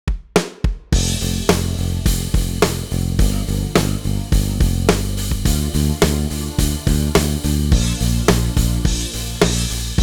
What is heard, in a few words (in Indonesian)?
chord drill iringan rock, bass